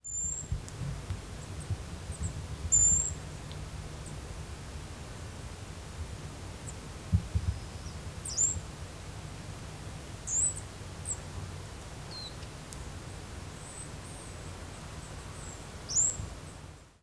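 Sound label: Madeira Firecrest juvenile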